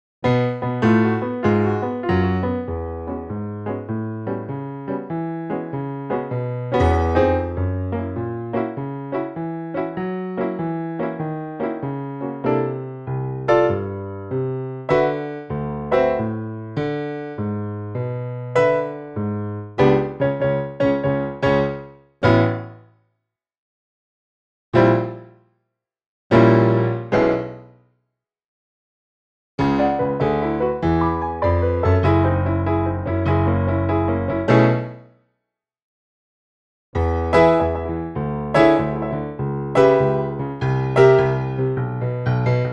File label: Backing Track